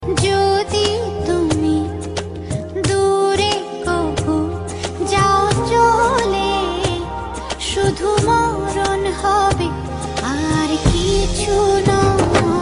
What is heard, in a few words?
Song Ringtone